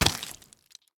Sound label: Drowned step; minecraft